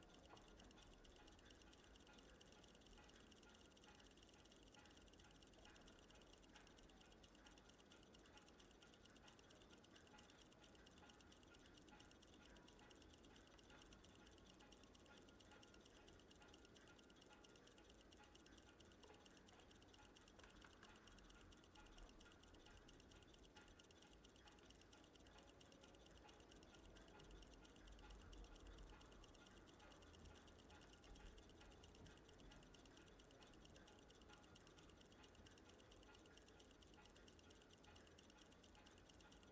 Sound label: amb collection pre explo; jinx